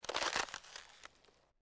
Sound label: ice; Soldier Of Fortune II Double Helix; XBOX; kamchatka